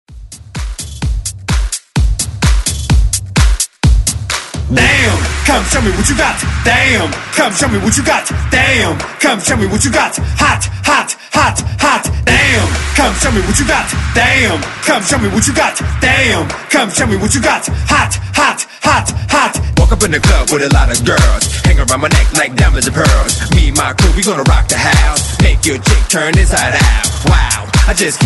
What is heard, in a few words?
128Bpm